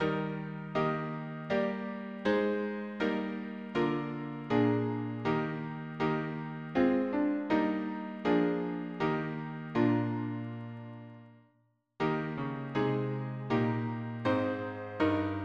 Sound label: harmony piano